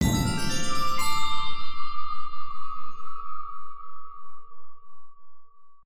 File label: Combo High; Mixolumia